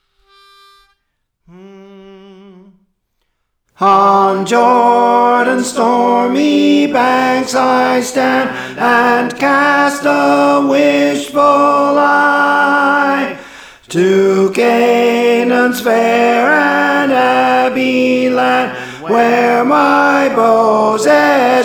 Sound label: Tenor, Praise For The Lord Training; On Jordans Stormy Banks (OKane)